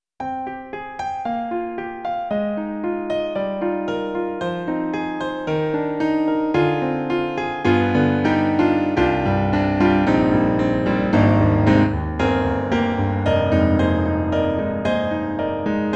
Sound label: Roland SonicCell Piano 2